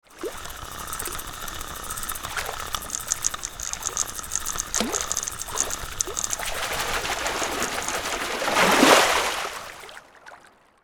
Fly fishing catching a fish boat waves splashing

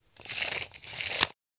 Measuring tape retract

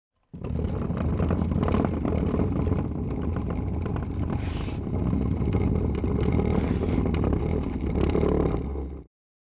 Dragging a rolling chair

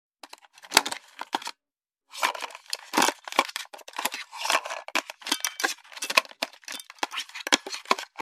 Cassette player tape insert and deck close

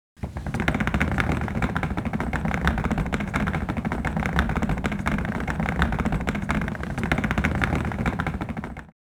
Suitcase dragging rolling on uneven floor

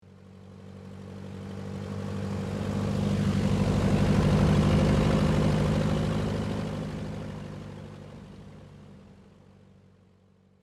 Light aircraft pass by and then fading away; airplane

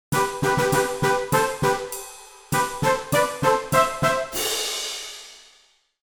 You win success victory fanfares; cartoon ascend

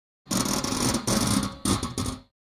Metallic spring creaking fast

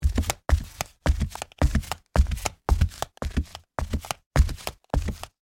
Flip flops fast walk on wooden floor

